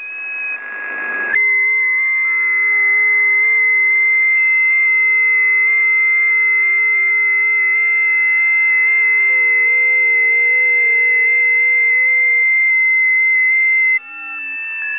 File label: 20m busy, ft8; ft8 lib; rpitx; Karlis Goba